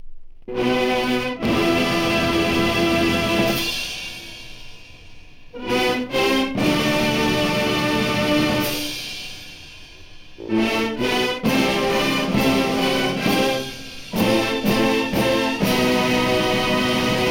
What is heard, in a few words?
fight song